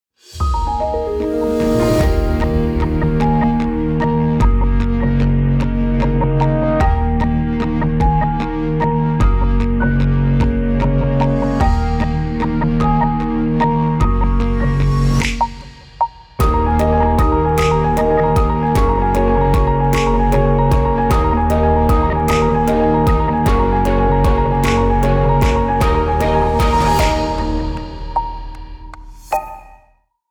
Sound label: Theme; A User's Guide To Cheating Death